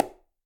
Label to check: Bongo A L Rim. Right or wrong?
Right